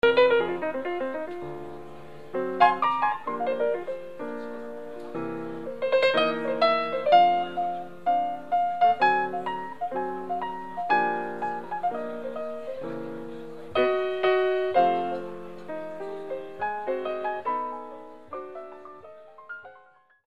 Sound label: blues riffs